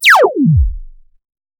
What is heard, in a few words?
soft subtle sci, laser